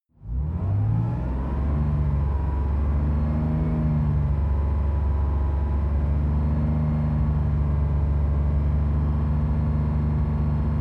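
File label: sports car interior engine drive constant slow; verkehr transport; autos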